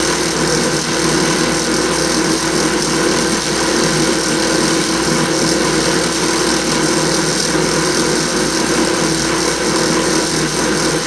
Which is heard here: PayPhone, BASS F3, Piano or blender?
blender